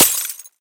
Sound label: glass impact break small; bluezone; sonniss gdc